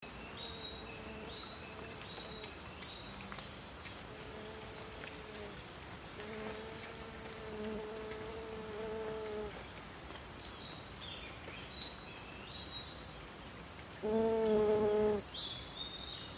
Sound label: bug